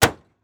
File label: Truck Ford Ranger Close Hood; transportation